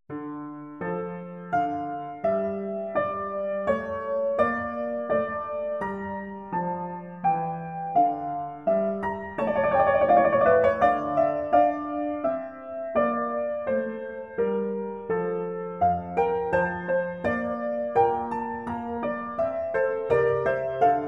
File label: Trio Sonata; Telemann; TWV42-d4; Essercizii Musici